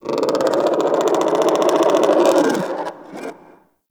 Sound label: Creature Growl; Warframe; Tau